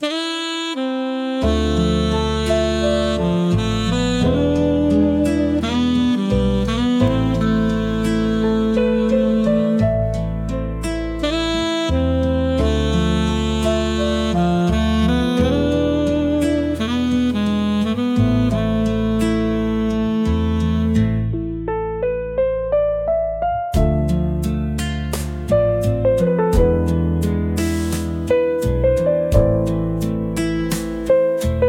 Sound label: saxophone dreams